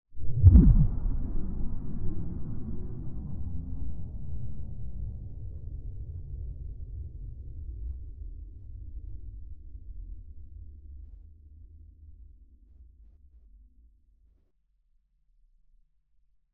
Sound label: crunch woomph